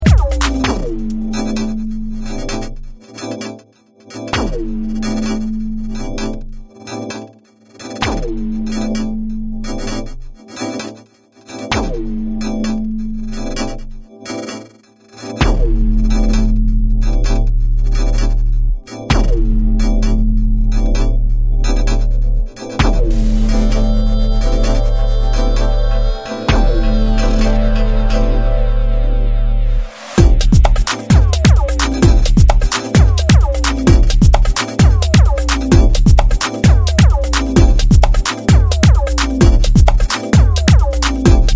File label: Meow Meow; House